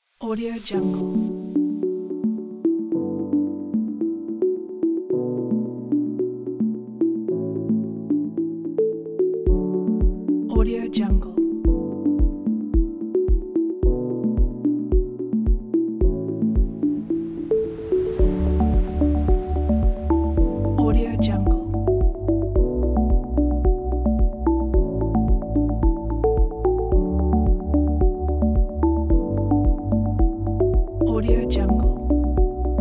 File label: ambient music